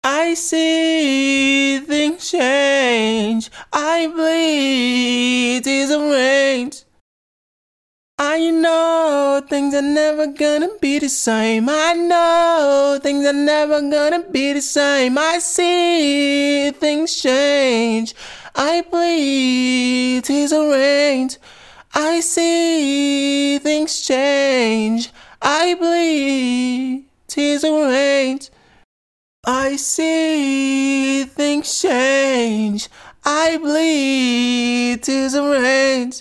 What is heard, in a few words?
chill out 100 Dbm; acapella